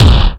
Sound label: silky kick drum b key